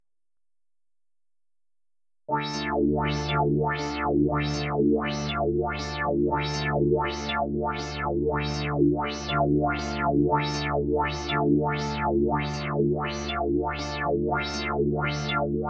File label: Baroque Piece in Dm w. wahwah